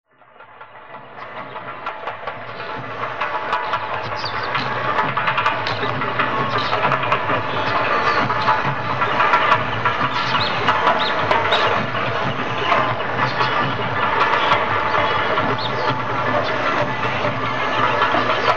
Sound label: Drums Birds, Field Recordings From India Nepal